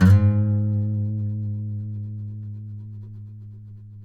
COLLINGS G#2, Guitars; Spectrasonics; Hans Zimmer Guitars VOL-2; FNGSTYLE PFM

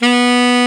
TENOR SAX; Northstar - New Gold VOL-1

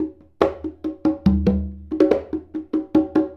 Congas; Essential Percussion

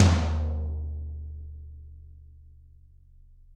Toms, Drums; Bob Clearmountain Drums I

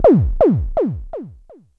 ECHO FX 2, ANALOG ECHO; Zero-G Ambient 1

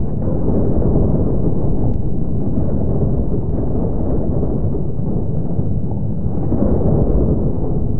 Drone Ambient; Drums & Drones

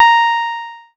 A#, PIANO; Big Fish Audio; Synclav FM

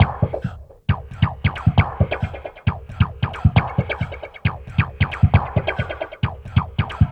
DRM, House, LOOPS 135; Best Service ProSamples vol.10 - House; AKAI

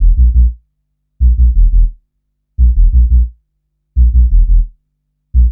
Gwaan Bass, Drum & Bass; Fluid Dynamics

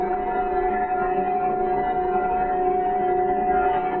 Tonal Re Draw, sparse soundscape

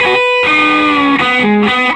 SOLO, Vintage Blues Guitar; USB Soundscan vol.22; AKAI; SOLO B125

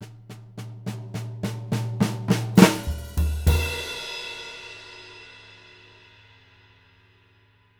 JZ70 SWING, Living Drums; Sampleheads; Peter Erskine

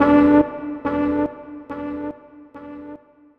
Trumpet Echo

